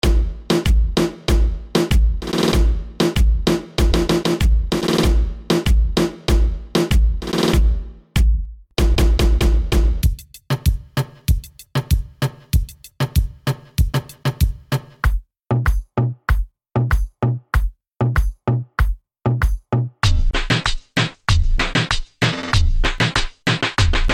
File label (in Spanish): Dembow Dominicano